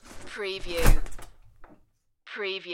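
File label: Umbrella Open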